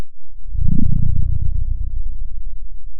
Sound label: pop, Sound of a dinosaur